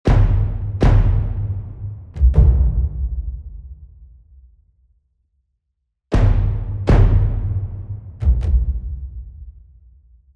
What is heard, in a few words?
cinematic drums